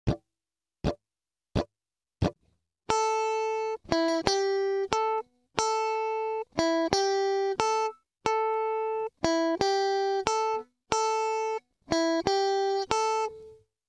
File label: r lick, blues guitar